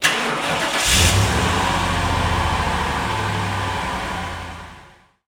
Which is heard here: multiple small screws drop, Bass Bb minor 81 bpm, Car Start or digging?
Car Start